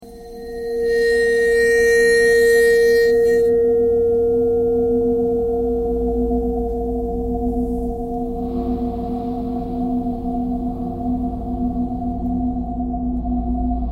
ice bowed vibes traffic k core birds thread pentatonic; bowed metal